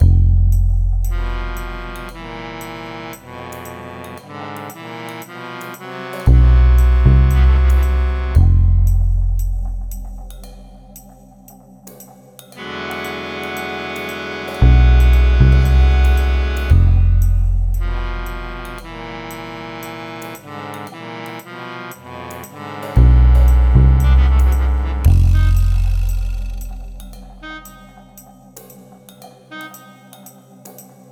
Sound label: vinyl blight sad trombone vibes